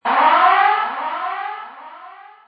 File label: Alert; yet another pixel dungeon android